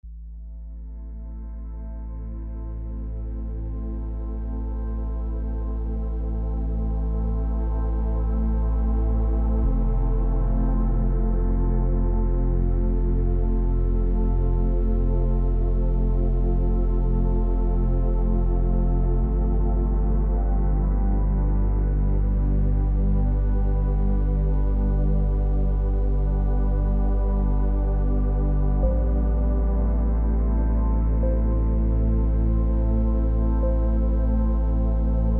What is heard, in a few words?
Nebula Soundscape